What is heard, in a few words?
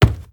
Soft Wood Run; moul-assets